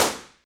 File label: DSP Room Far, Impulse Responses; Small Spaces; Indoor Spaces; Apple